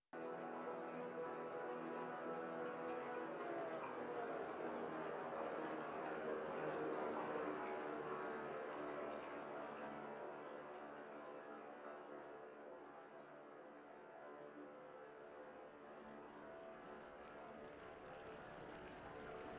water; Sound design for the opera composer: concepts and methods; Her face was of flowers audio cues

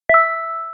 text receive; Sound design for the opera composer: concepts and methods

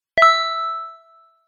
text receive

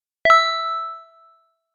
text receive

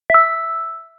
text receive; Sound design for the opera composer: concepts and methods

